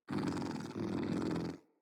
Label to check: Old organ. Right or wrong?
Wrong